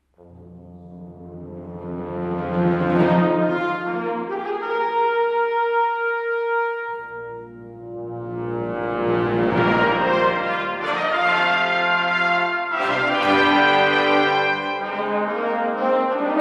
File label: Copenhagen Brass